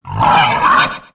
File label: Grunt; Eldin; Lord Bullbo grunting while running